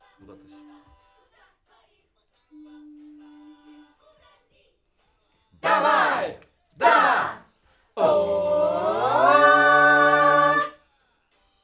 Backs AKG

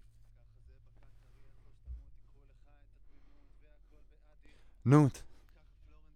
Voc OD

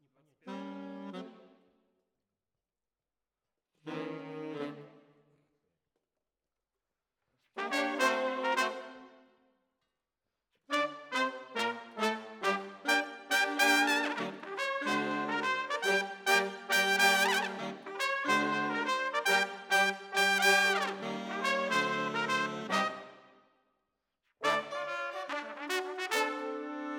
Brass Over

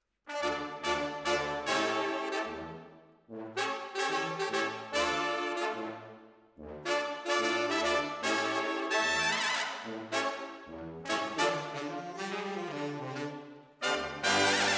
Decca, Jazz for piano

